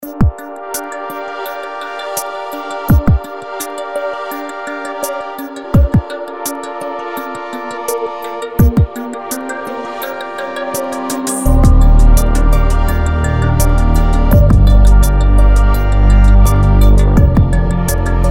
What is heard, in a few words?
Commercial Score; Bloomberg